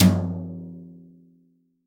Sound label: TOM, CTD TOM BRSH